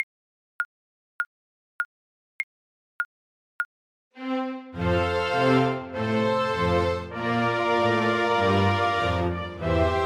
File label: the internationale, Flute